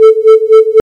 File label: volume sine 4hz